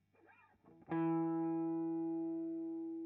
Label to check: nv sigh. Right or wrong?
Wrong